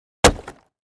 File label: Axe Fire Log, axe hits